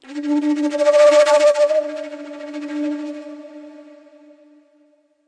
flutter flute; Flutes

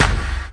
Clap; Moombah Kit

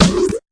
fx drum

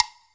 lo block; Western and Latin Percussion; single hits

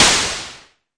mbrute noiz, Arturia MiniBrute

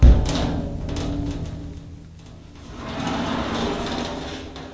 deep banger; drums single hits; Weird and Interesting Hits

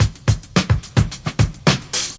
109 straight forward natural; 101 to 110 bpm

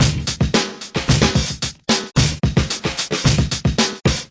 111 got cut up all over, drum loops and breaks

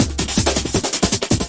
160 latin dnb, drum loops

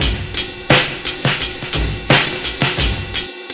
086 bpm slow rollin ride

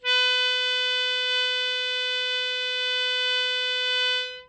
hohner soprano melodica B3

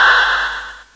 shot, VIDEO GAMES

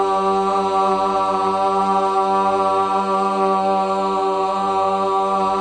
Fem G1, Mellotron Female Choir

